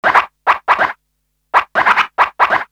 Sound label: scratch, party